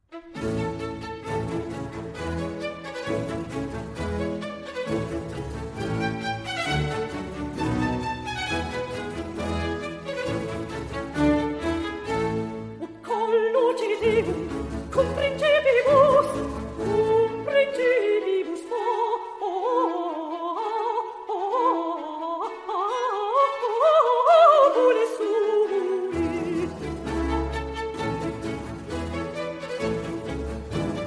psalm for soprano, 2 violins, viola & bass; Vivaldi; Laudate, pueri Dominum; psalm 112; RV 600

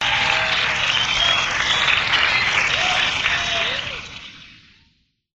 Crowd Applause; KSJO FM Broadcast Keystone Palo Alto CA 14th August 1979

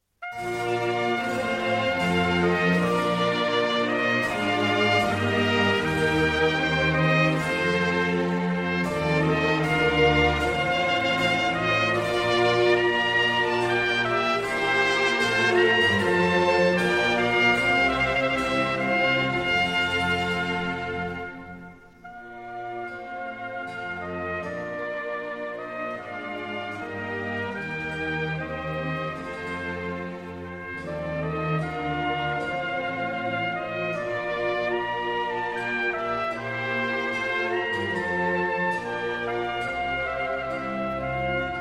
Oboe Concerto in G Minor, Sarabande (Largo); Oboe Concerto No. 3 in G Minor, HWV 287; III. Sarabande (Largo); Adolf Scherbaum

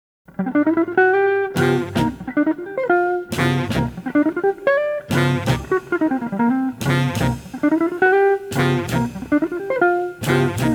Blues

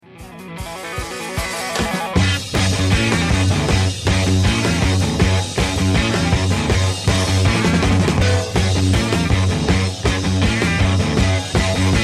Guitar

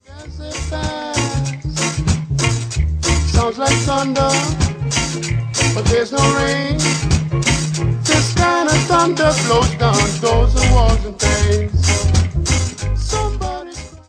Vocal

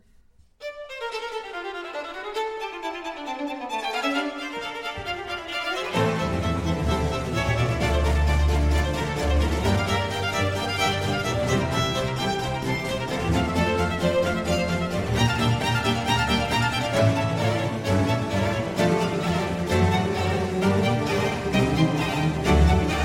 Concerto for Strings in D Minor, Allegro; RV 128; Vivaldi; Concerto Italiano

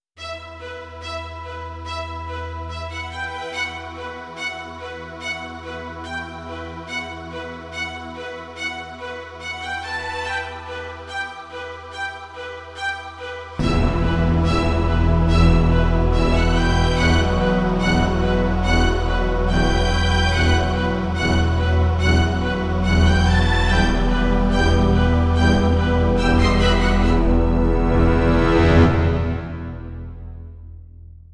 Instrumental

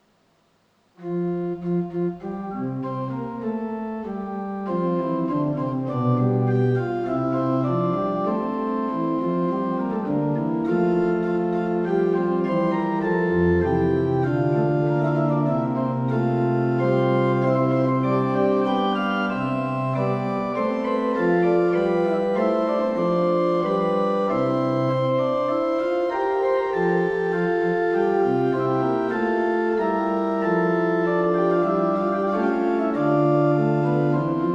Fugue, Organ; Magnificat quinti toni; Johann Pachelbel; Complete Organ Works

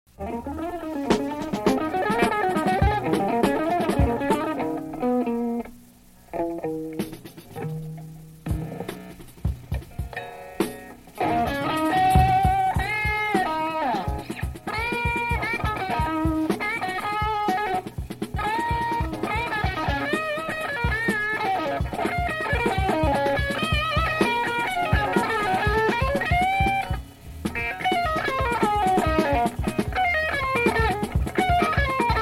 Instrumental Jam

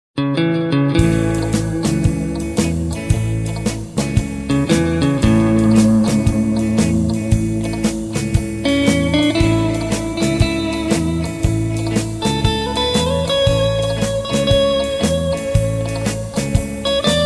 Lonely Guitar

